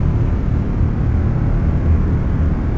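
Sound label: door large loop; Theed; Star Wars Obi-Wan; XBOX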